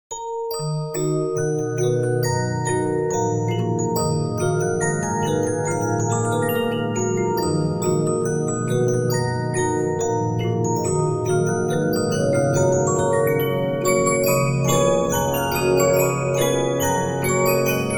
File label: Regina, 15inch disc musicbox